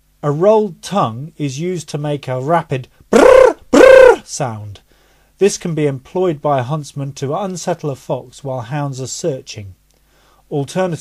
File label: A ROLLED TONGUE, calls